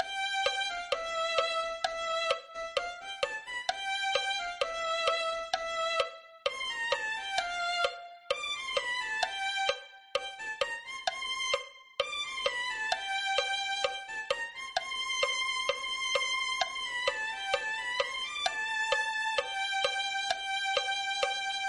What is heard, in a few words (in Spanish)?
violin